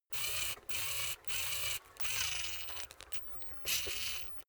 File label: servo move high